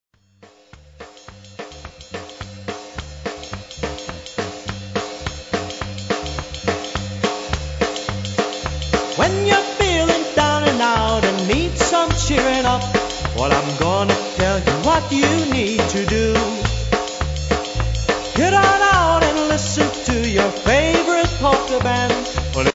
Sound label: POLKA CELEBRATION